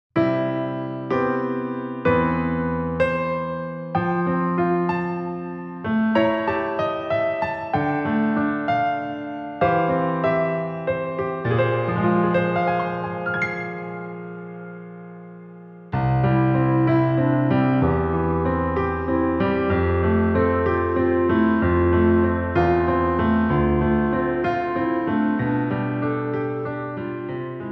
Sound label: piano